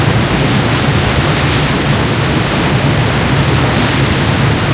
hydroplane move

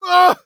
hit; Escape from Tarkov; scav6

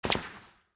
bullet ricochet; War Thunder

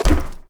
wood run

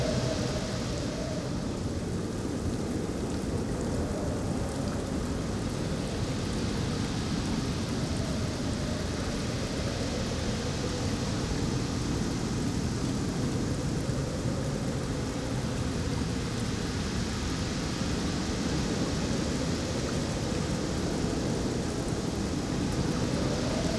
ambience arctic beach day calm drizzle; Rust

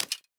salvaged axe tap; Rust

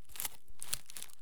item crackers foodtake; Escape from Tarkov

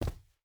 footstep hide carpet jump land; Rust

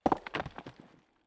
ambient; World of Tanks

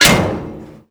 connect container

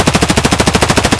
mp5 outdoor close loop; Escape from Tarkov

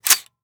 lr300 charging handle shut; Rust

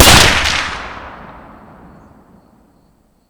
Inf Weapons; Red Orchestra 41-45

